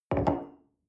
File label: coffee place table soft